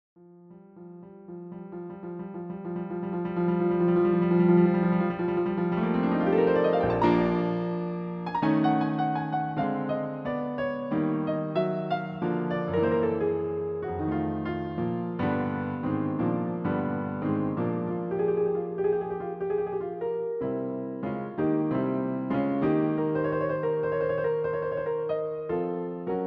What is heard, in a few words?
piano roll; George Gershwin